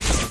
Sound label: stealth off; tbd-station-14